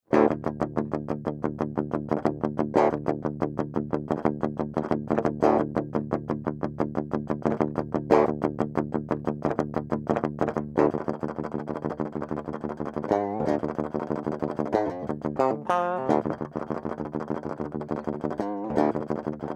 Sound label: EMG-81